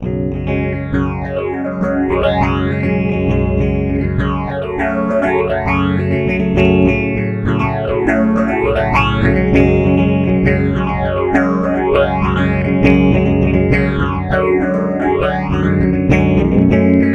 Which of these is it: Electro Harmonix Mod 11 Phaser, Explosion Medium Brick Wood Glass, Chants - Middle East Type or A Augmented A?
Electro Harmonix Mod 11 Phaser